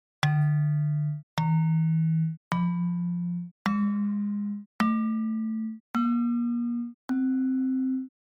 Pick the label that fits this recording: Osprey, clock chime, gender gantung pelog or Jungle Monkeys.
gender gantung pelog